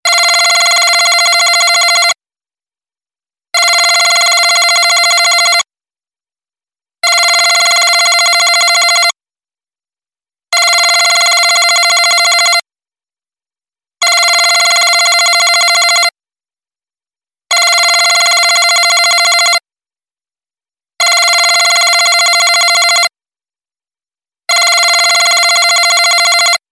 Different old phone

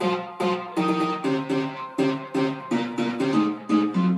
notification

